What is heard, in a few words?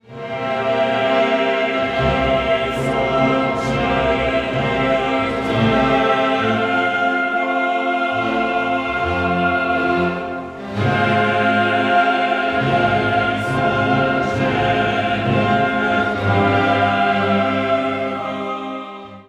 Sanctus Giant organ